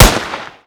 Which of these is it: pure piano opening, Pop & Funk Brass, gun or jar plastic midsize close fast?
gun